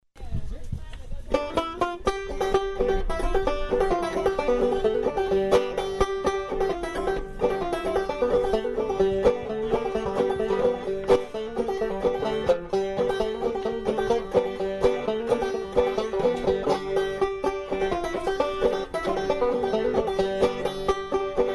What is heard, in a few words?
Banjo Break